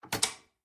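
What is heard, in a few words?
Server Battery; raw game audio files; MiSide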